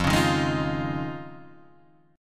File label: F Minor 7th Sharp 5th, Guitar, Half Step Down